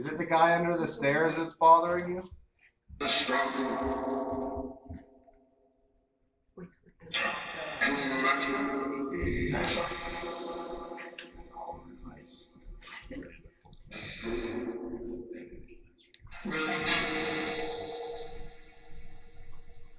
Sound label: Barbershop Phasma Box